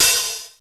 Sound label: OPHH; E2 DRUMS; Crypton - DrumBase5000